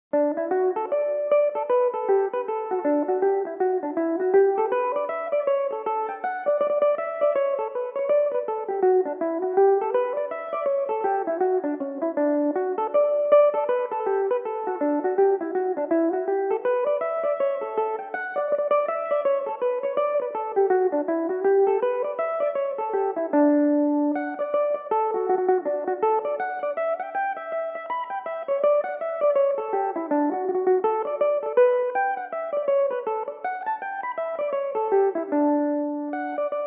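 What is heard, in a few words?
D major, Irish mandolin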